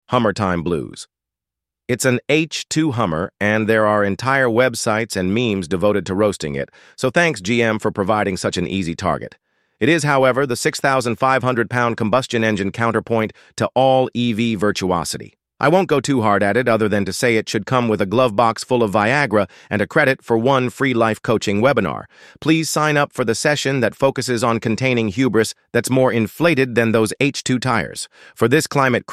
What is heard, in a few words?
Hummer Blues